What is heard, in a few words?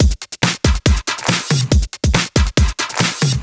Break Beats, 140 BPM; Vengeance - Essential Clubsounds Vol.4